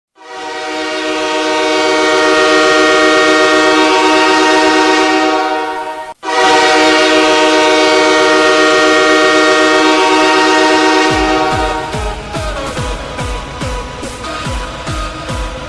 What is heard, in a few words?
Goal Horn Alternate; Florian